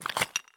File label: walk tile; Escape from Tarkov